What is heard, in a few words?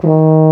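Brass Baritone D#2; Roland - LA Composer vol. 1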